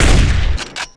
Shotgun; BaboViolent 2

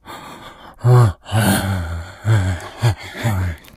moan, infected; npc; idle

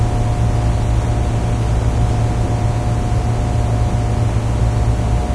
mall ambience loop

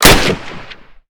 m1 carbine Shoot; Minecraft; Infamy; Last Alive Pack

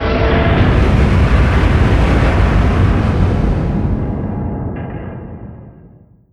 distance ships destroyer death; Hearts of Iron IV Death or Dishonor

